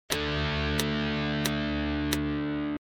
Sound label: This is a D5 power chord played over a four c; the 5th fret on the A string, the 7th on the D, and the 7th on the G string; Power Chord Lesson